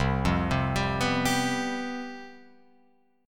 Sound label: C Major 7th Suspended 4th C down Guitar Open F; arpeggiated